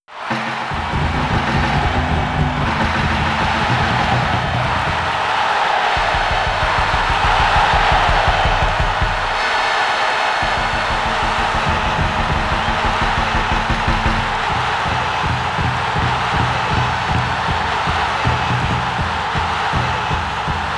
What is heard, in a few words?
Spectator Chant; Heat of Eleven 98; arcade